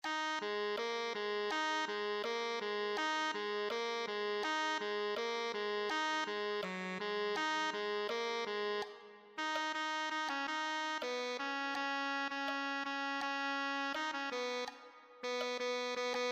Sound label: tenor saxophone